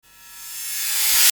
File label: Crash